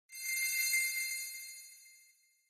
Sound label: moods zen